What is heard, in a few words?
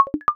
notification pops